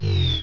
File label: Power Trip; battle anims; PokeRogue